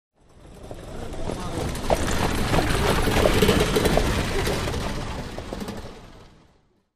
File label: Carriage By Fast